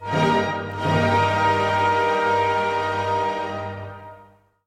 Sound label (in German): Akkord